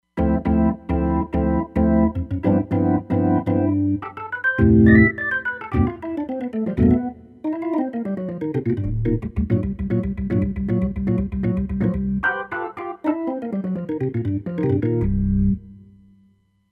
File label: FS Perc Org, Roland Fantom XR